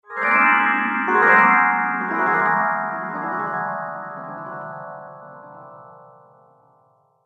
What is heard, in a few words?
Haunted Chimes, Scary Tone Sound Effects; horror